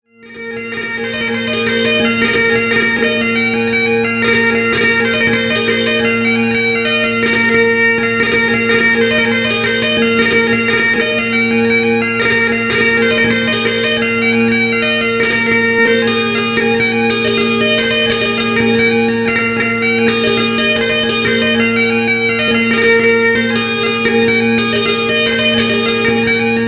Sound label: Jig